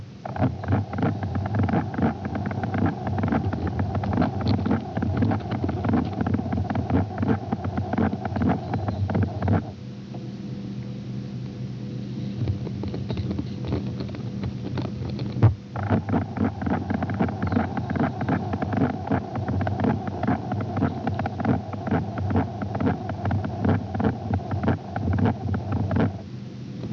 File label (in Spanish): macho canto